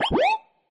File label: warning; Gotham City Speed